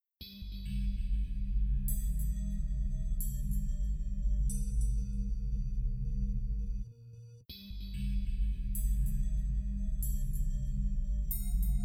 SY85 clone; 1H6 SR

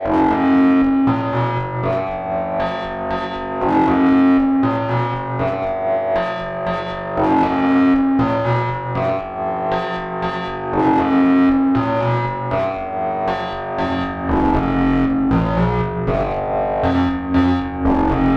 Taiga obscure wah wah sequenz; pittsburgh taiga 5

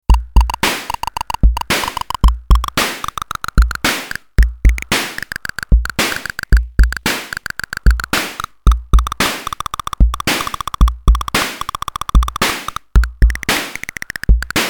AVP MAD-5 Clap

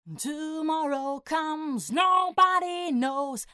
growl

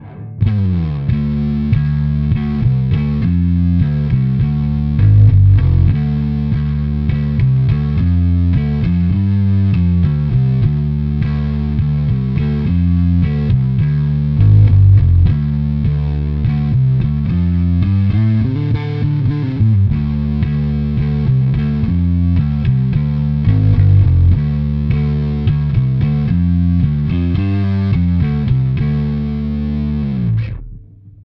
Clean Channel Big Muff

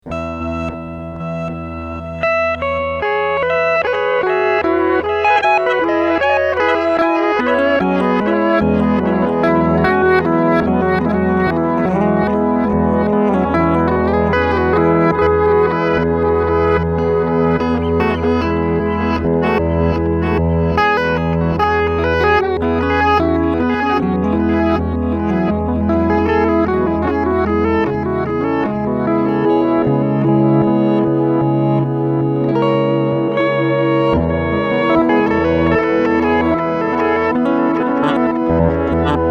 Reverse Delay

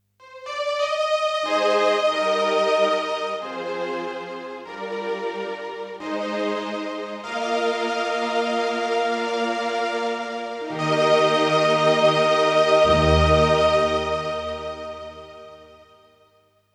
Strings

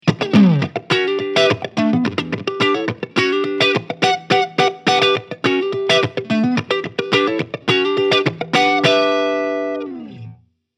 Marshall 1992 Channel 1 Clean

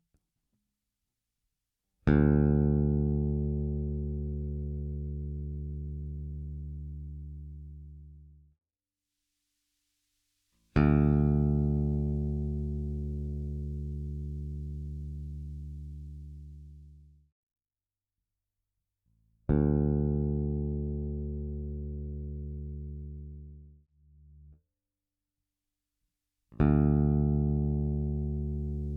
Warwick Gnome i Pro V2 High EQ

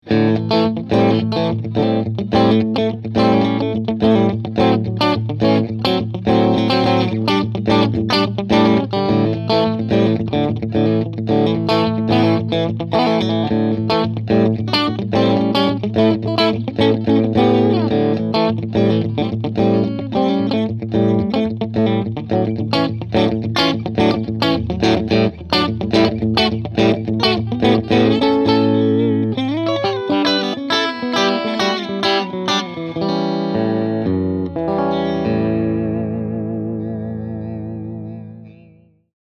Clean EQ Full

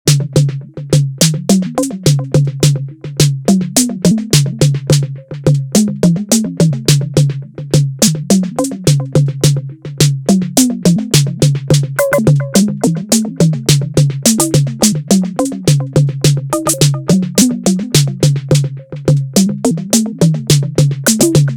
XAOC Test Pattern Mode

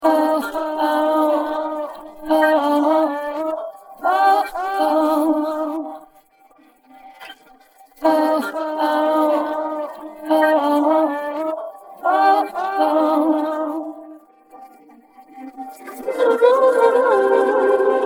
Song Vox

